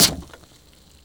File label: open; garrysmod; perks